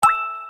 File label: Water notification